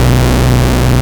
Filtered B, Basses; Drum Bass; Zero-G; Total Drum Bass

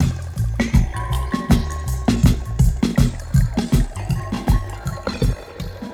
Ambi Groove

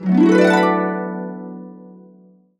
D, Harps; USB Soundscan vol.62; AKAI

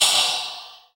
FX Dance

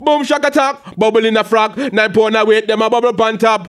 HAL RAGGAM, RAGGA-MUFF A maj

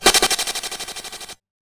Bent Cymbal

